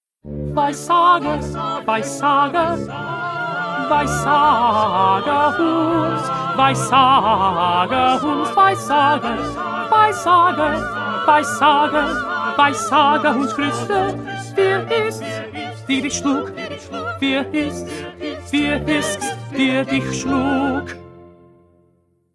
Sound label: 1st Tenor; Bach-St-Matthew-Passion-42b